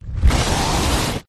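swell rumble bass lfe crack background into hit whoosh ascend growth explosion; explosive rapid; Skyclad Sound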